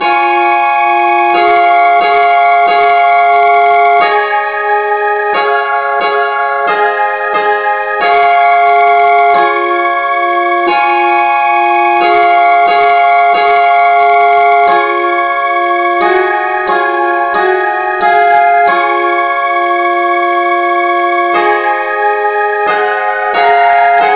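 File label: no vocal key C sharp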